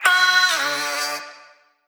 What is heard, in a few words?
Vocal one shot D